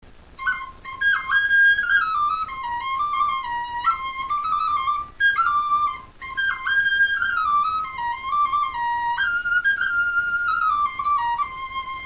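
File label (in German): melodie, Knochenflöten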